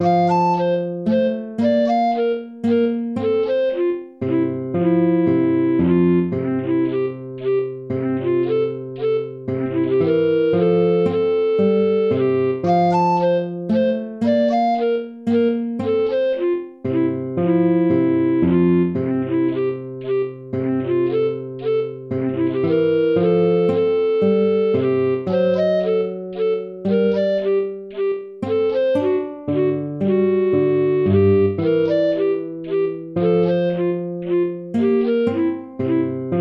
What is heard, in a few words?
minuet; mozart wolfgang amadeus